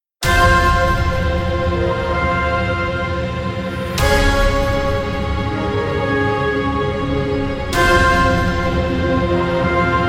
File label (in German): hybrid Piratenflagge